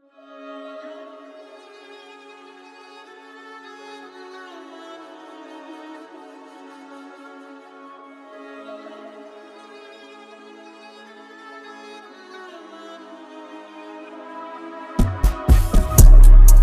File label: experimental 120bpm